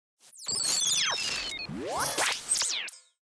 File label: Stinger Warble Rear To Front; Stinger Warble Feedback Front To Rear